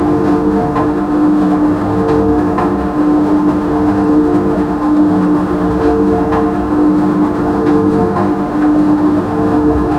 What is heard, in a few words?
looping sound of an old, home appliances